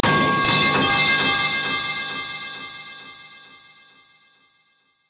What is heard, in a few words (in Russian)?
armatura padaet v iamu